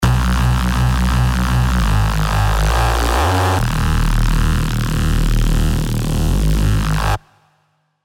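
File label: bass, serum2; Toddler Tantrum 5000; alonso fireworks stand 1776 edition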